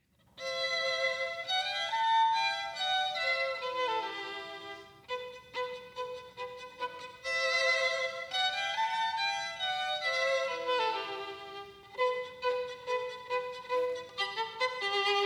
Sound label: 2 Violins